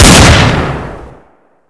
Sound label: deagle shot, weapons